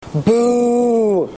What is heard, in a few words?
Boo